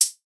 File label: Tambourine